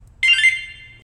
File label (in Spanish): activacion, ringtones de alarmas; Positron